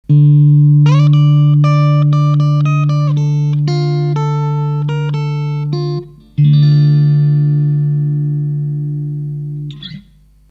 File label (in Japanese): TREMOLO TURTLE OFF, 揺れてませんね？揺れてませんよね？; まずは「TREMOLO TURTLE」無しの音です。